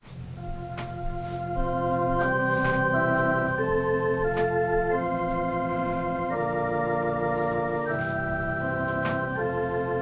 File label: Organ prelude